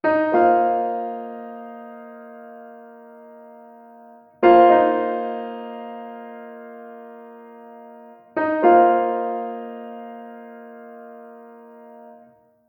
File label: ringtones; HTC; One-M7